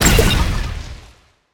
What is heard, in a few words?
explode; smite; ahpuch skin10